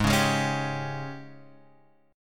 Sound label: G sharp Major 7th Suspended 4th G sharp; G#M7sus4 strummed; JGuitar